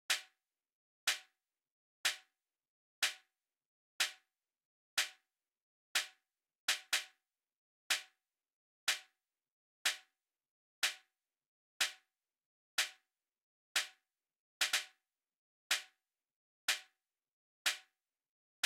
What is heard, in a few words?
SNARE